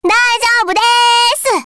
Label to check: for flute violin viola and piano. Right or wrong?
Wrong